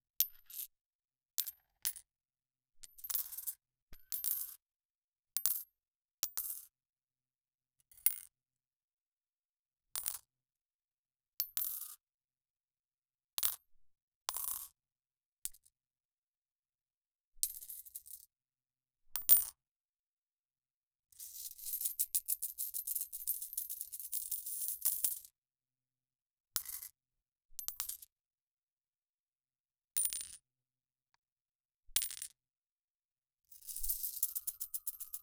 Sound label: soft coins clinking